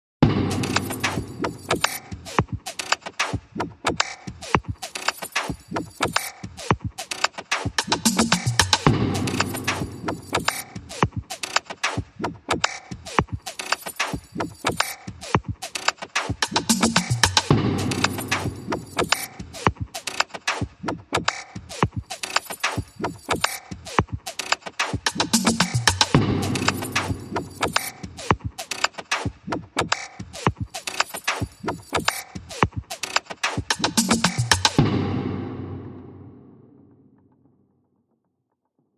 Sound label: Beats